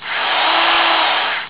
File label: drill short